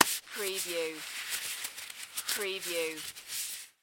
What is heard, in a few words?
Latex Gloves Rub; sound-effects-bible